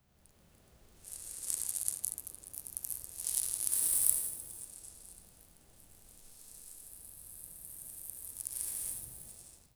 une explosion de sable, sand

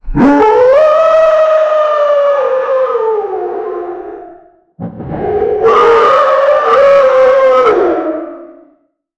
fais un cri dune bte, alligator

